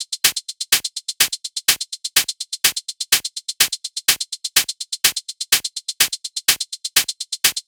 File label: 125bpm Hihat